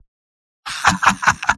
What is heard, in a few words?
spy laugh short; vo; tf2; mvm